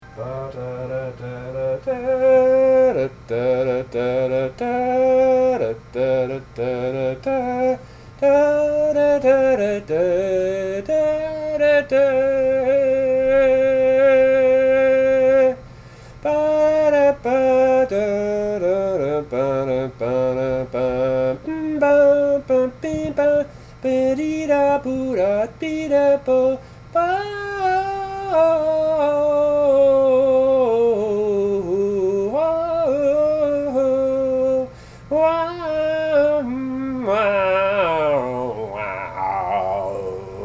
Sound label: Acappella